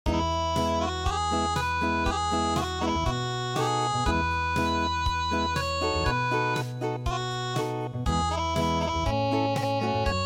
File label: choo choo train Unplugged